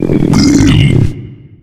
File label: male boomer warning, voice; Left 4 Dead 2